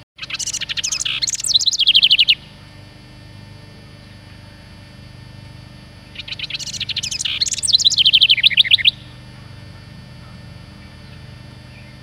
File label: Wren song